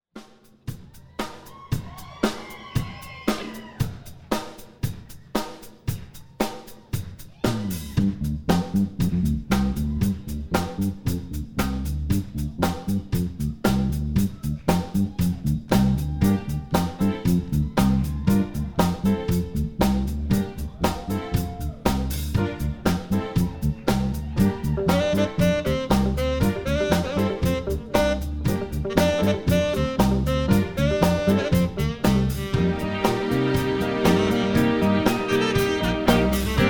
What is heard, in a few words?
live mix